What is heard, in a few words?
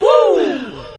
woo